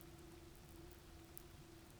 pulses, BALAENOPTERA; MONACO 2022-07

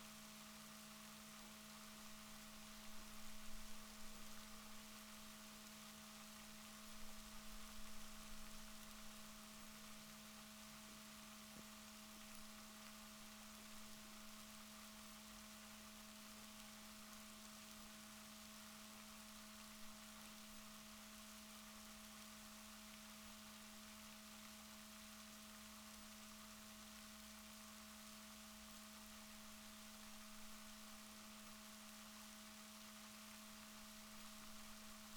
rorquals positif, Balaenoptera Physalus Chile

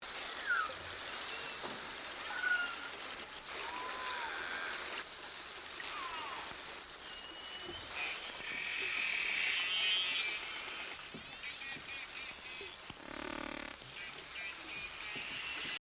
Narwhal, Voices in the sea

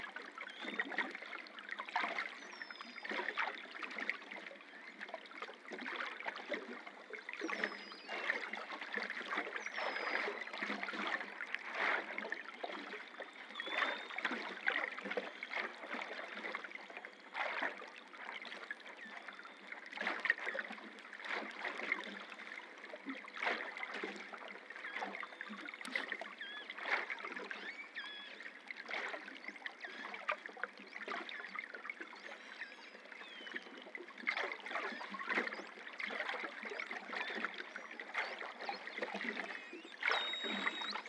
globicephala Melas; Globi antilles

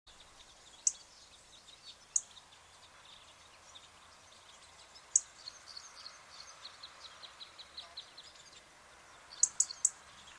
Erithacus rubecula; SETE

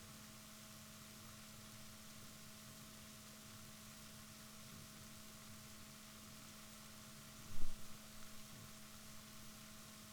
rorquals negatif, Balaenoptera Physalus Chile

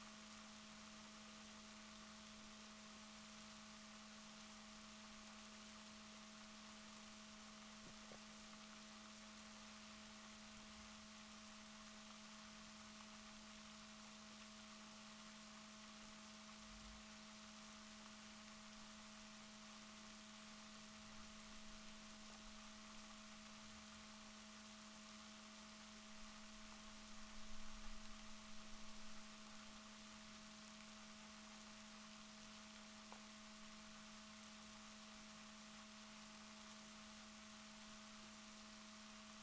rorquals positif, Balaenoptera Physalus Chile

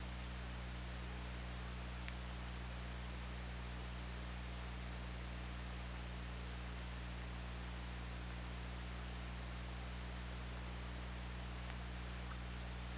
OrcaLab

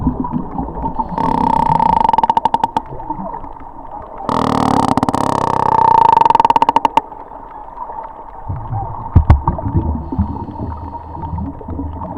individual clicks; ROMEO; GOPRO HERO 3; Maurice

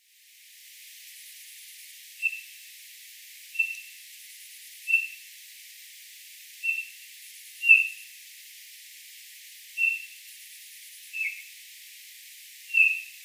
Pyrrhula pyrrhula; SETE

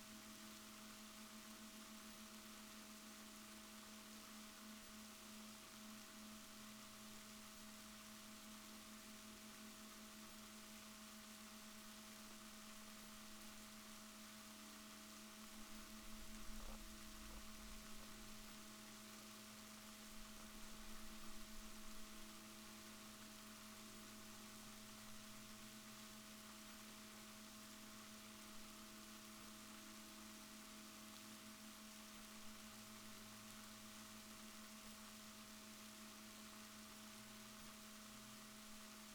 rorquals negatif, Balaenoptera Physalus Chile

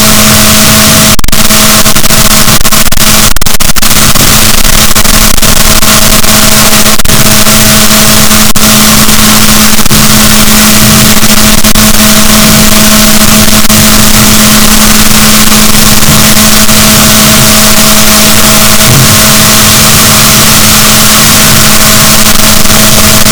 rorquals, Balaenoptera Physalus, boat; rorquals positif; Chile

